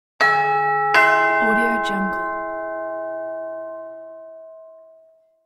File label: door chime ding dong